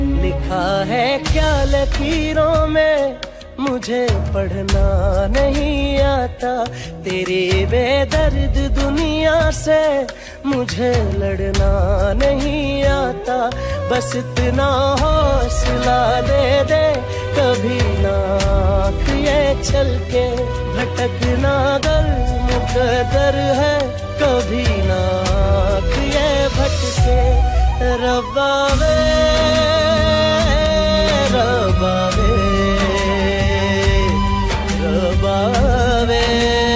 Sad Hindi Song

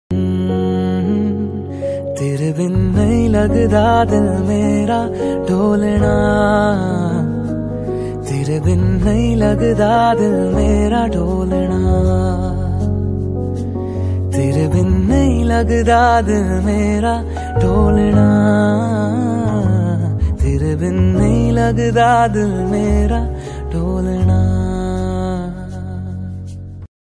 Love Song Ringtone

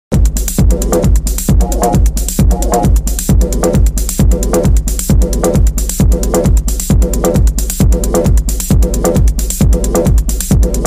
Beautiful Arabic Music Ringtone; ringtones

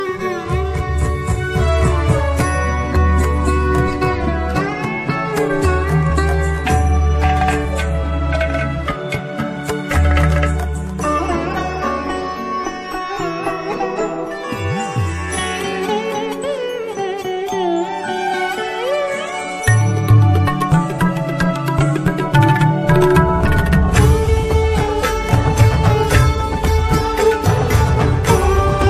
Desh Bhakti Instrumental Ringtone

